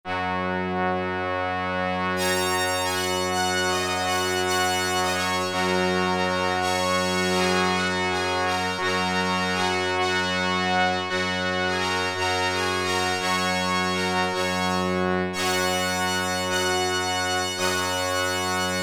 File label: BAGPIPES, Oberheim OB-8; Factory Patches